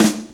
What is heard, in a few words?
SD, Dance Mega Drums; Best Service